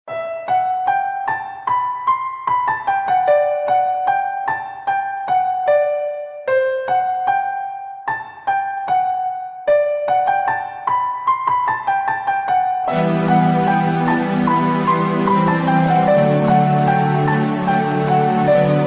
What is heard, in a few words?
sad dj